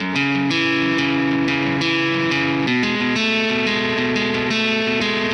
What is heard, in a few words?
90 C-m Guitar WET; Brage